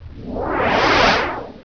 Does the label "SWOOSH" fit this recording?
Yes